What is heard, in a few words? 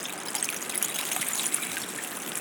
Icy Stream, On The Glacier